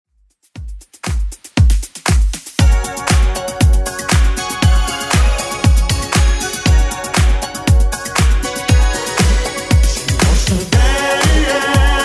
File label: 80s Club Edit 118bpm